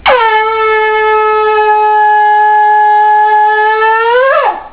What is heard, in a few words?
shofar